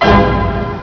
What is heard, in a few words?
Get Special; Greebles 1.0